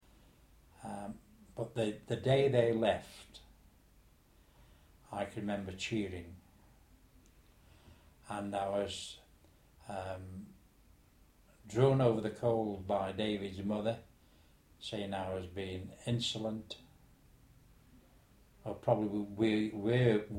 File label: cheering